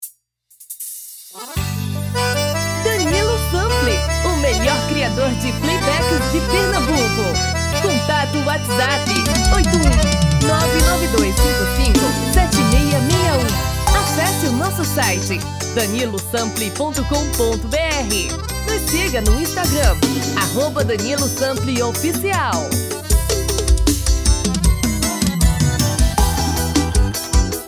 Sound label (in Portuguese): dois tom e meio abaixo